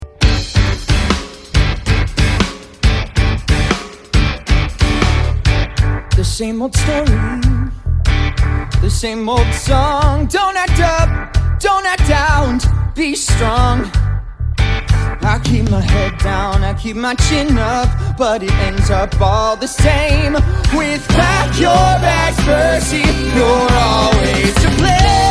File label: vocals